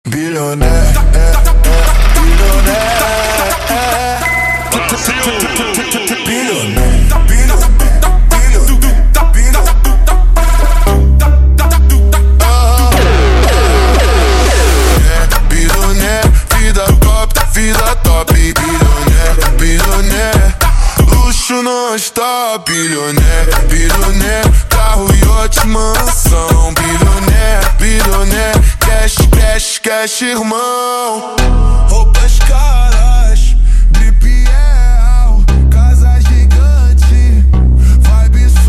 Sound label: Phonk slowed; funk